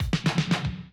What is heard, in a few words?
drum loop, 100 BPM